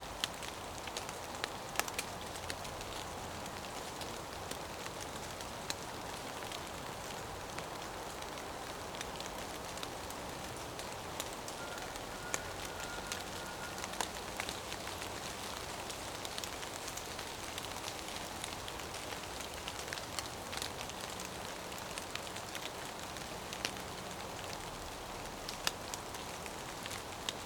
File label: nature rain forest on leaves distant traffic